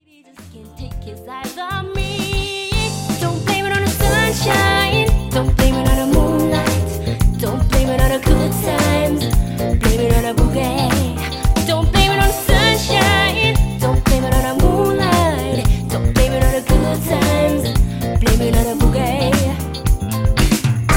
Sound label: Club Band Party